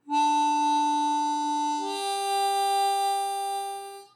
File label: C Major Draw 1 Into 2, harp